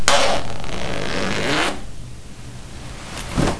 reissverschluss

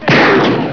revolverschuss

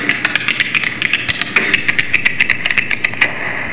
stepptanz; geraeusche menschl

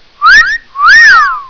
pfeifen; geraeusche menschl

